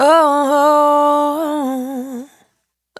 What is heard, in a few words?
Urban Dry, Single Voice Melodic Loops